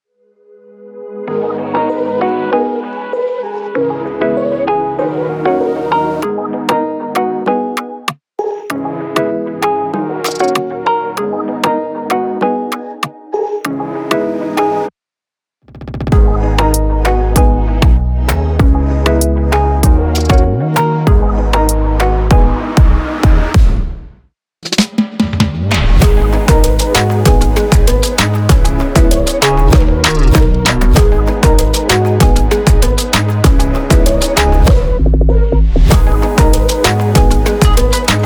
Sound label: Instrumental